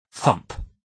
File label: thump